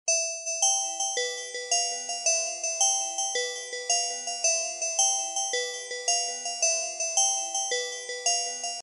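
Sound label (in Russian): short looped melody of passing time; vremya; korotkaya zaciklennaya melodiya iduschego vremeni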